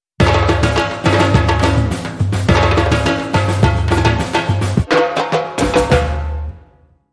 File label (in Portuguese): Duelo Bateria